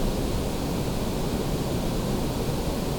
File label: Background Noise, Calibration Set, Desktop; Reference Recordings LF